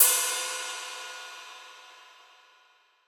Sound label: Ride; Cymbals; Ghosthack; Color Bass Essentials